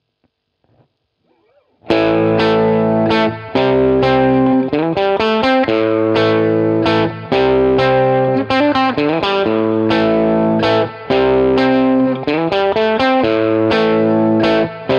Guitar riff Am Crunch

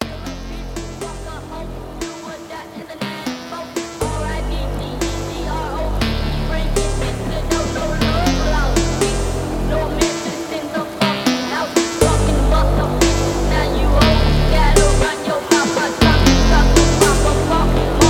Space Thunder

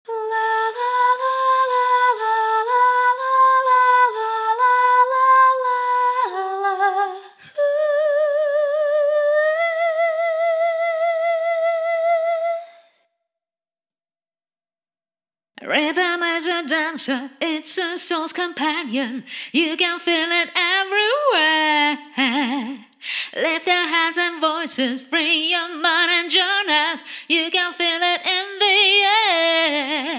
Acapella; Acapella Mix 90s Greatest Hits

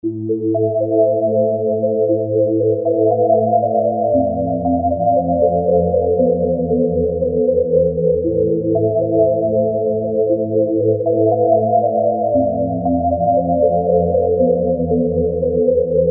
Instrumental Version

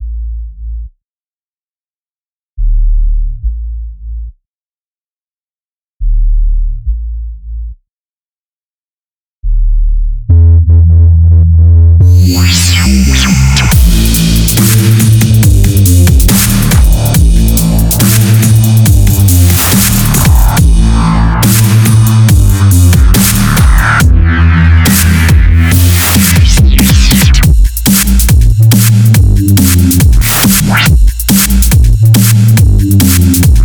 dub bass

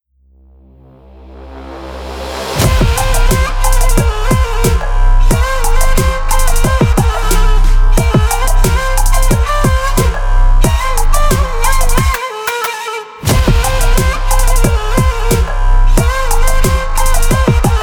Trap

